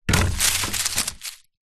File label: tree branch drop ground leaves; zapsplat